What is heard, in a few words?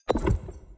environment room activation